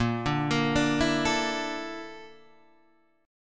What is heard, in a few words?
A sharp Major Sharp 11th, Guitar, Drop D